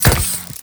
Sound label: Moa Step; Characters; Warframe; The New War; Veso